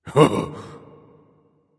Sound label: heh heh; smite; osiris